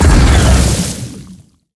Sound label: blood laser strong